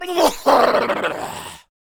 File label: attack vocals